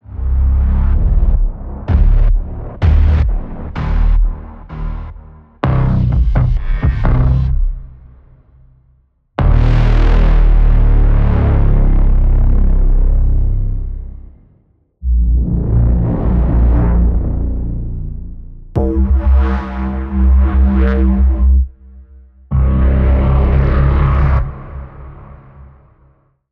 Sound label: DARK INTROS